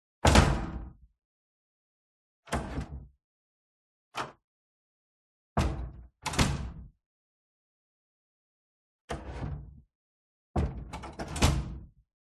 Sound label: door heavy classroom open close various roomy